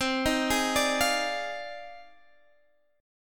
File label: F Minor 13th, guitar chord